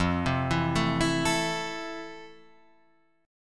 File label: F Major 7th, Guitar, Open F; arpeggiated